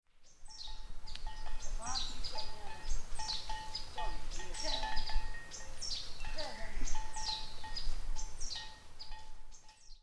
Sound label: sound cow